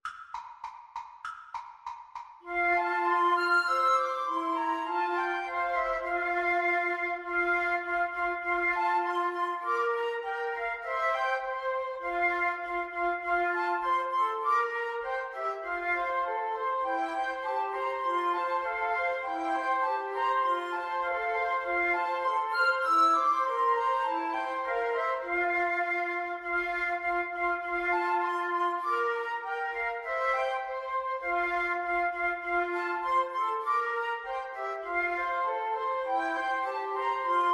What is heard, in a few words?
early one morning, Flute Trio